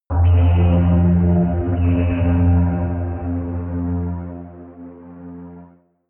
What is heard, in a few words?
synth chirp deep, drones